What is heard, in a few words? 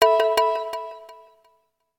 ALERT